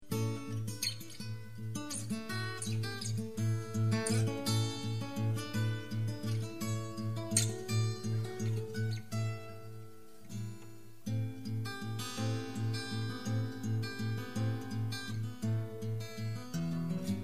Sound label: Instrumental